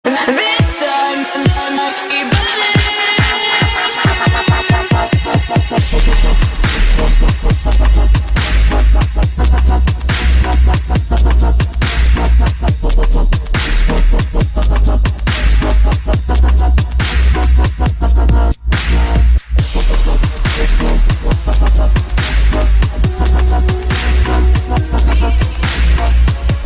Dubstep

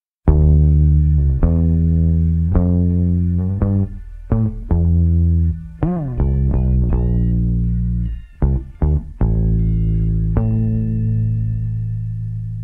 bass

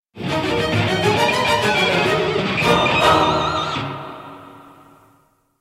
Fugue

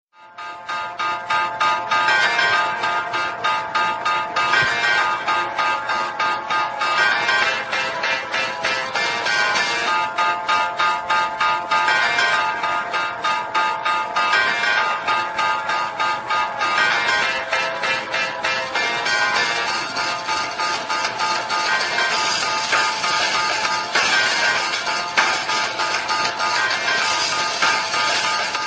Hip Hop; Black Berry

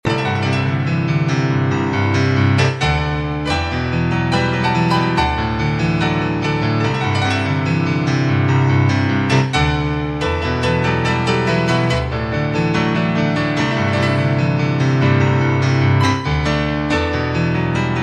Piano

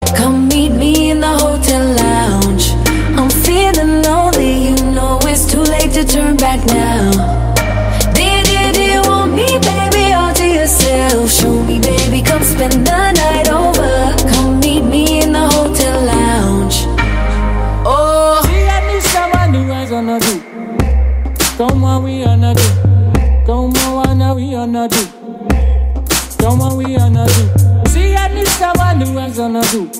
Hotel Lounge

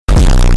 Distortion Sound

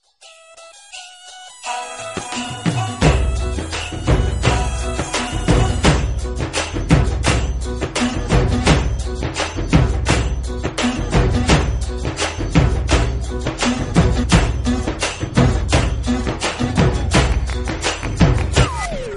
Drum Beat

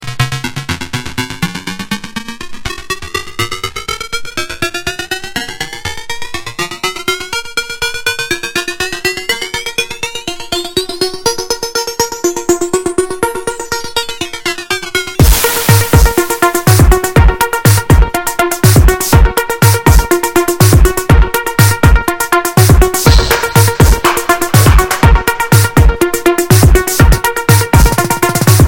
Bass Reflex

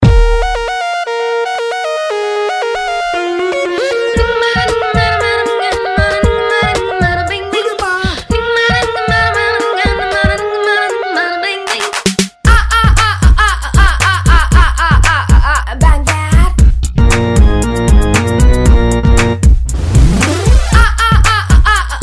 Funk Tone

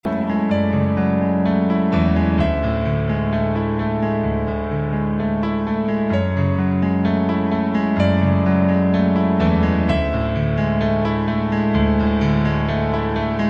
Piano

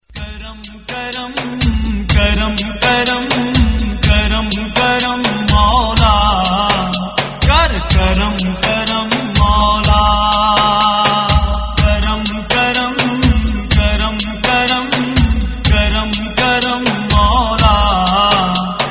Naat

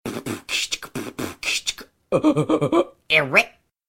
Beat Boxing; Orange Beat Boxing

